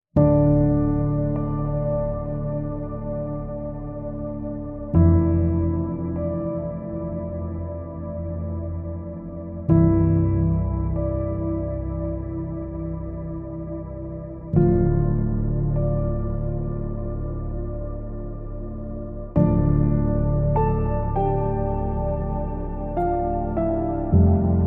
Piano Only

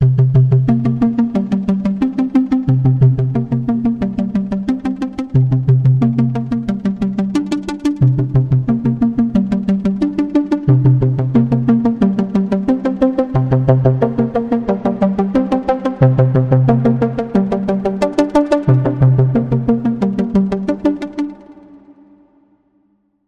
Arp Pulse

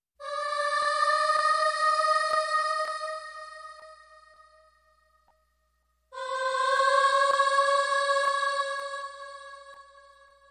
Evil Childrens Choir

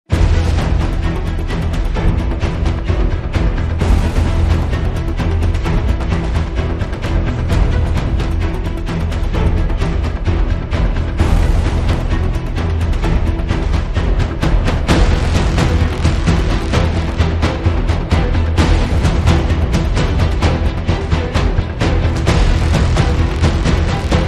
Less Brass

